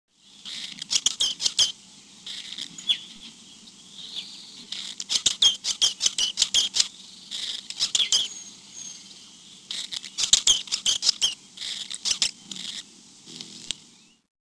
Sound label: berylline hummingbird